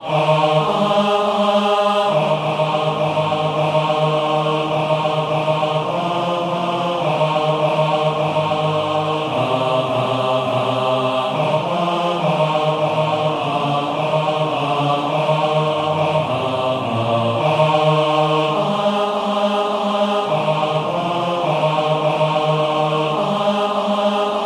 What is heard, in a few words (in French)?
Basses; Basses Hautes